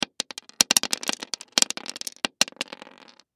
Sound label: Nut Multiple Drop Several Wood; Hardware; Industry; Blastwave